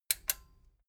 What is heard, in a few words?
metal button stereo click enable slow